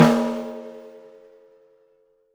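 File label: Snare Acoustic; Drums; Acoustic and Electric; Polyend; Simulacrum Sounds; 1 Shots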